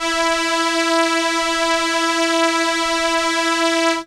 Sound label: Viola E4, synth, ARP Solina; musicradar-synth-samples